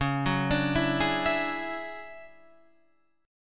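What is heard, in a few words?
D Major Sharp 11th D flat, chord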